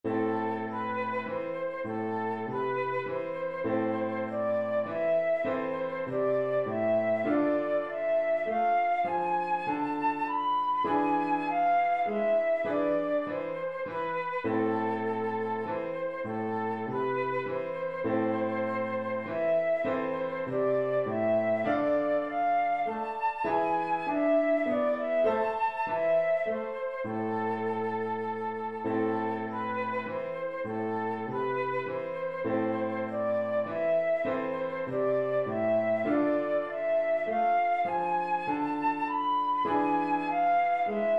scale study in a minor key; lewis alastair

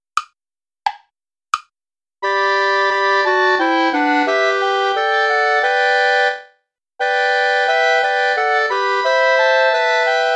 play along without clarinet

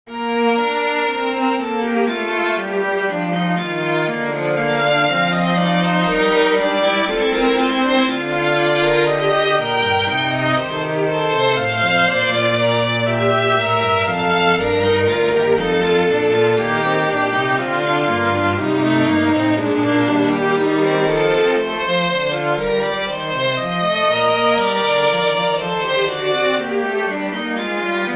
sinfonia bb major for string trio; bach johann sebastian

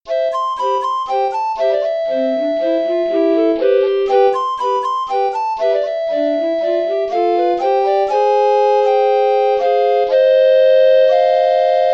for recorder trio; seaside carnival

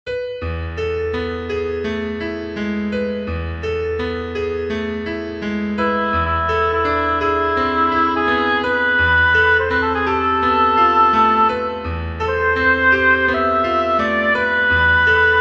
for oboe piano; falla manuel nana from canciones espaa olas

